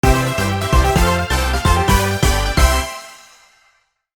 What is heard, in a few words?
Minigame Victory